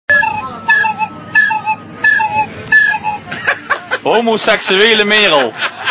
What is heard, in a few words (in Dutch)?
Merel; 70 Jaar EMM